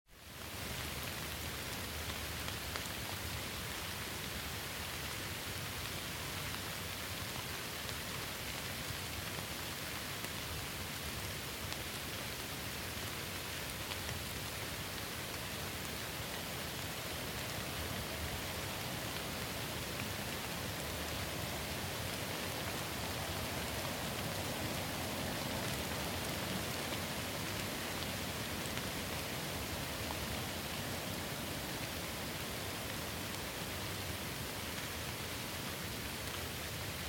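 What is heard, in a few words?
medium rain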